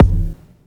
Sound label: kick